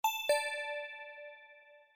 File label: start meeting